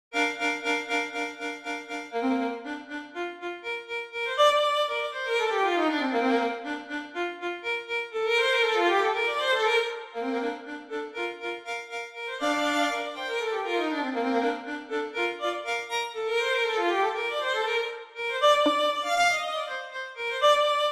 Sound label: violin solo; Tarina de pe Gaina